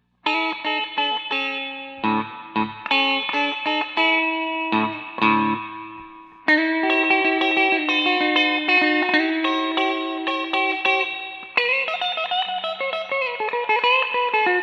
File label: Tele bridge clean, guitars